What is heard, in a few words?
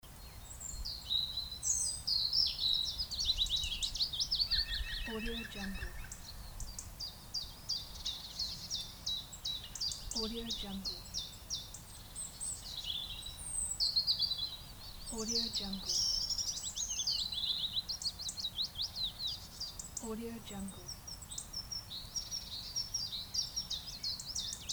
birds